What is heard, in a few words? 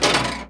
Ball Release